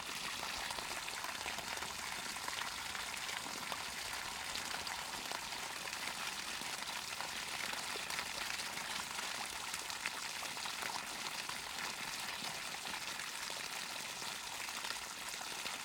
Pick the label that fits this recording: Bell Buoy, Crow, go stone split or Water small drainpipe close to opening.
Water small drainpipe close to opening